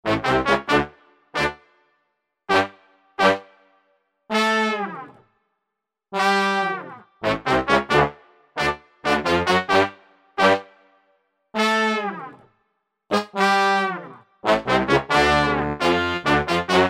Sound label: Horn Section; Apple GarageBand 2